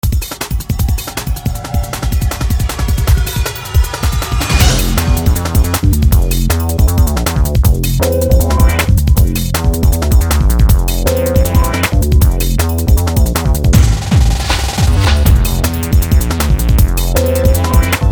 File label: techno